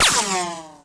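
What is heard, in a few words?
ricochet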